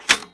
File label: equip item